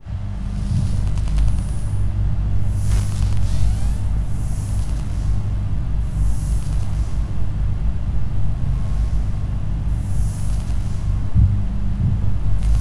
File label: ambient